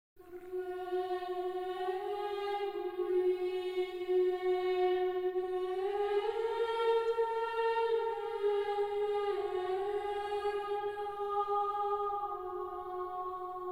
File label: Chant